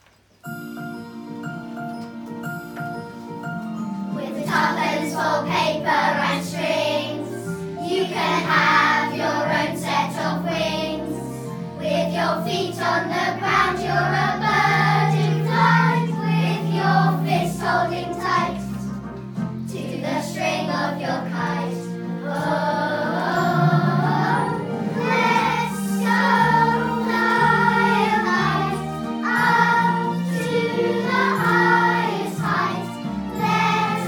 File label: Song